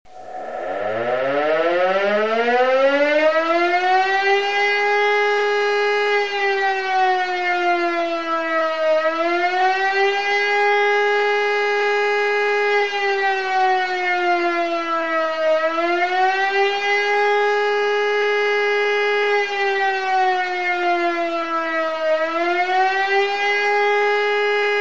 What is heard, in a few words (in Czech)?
vystraha rotacni sirena